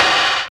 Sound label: SNR, Tekno Industrial; East West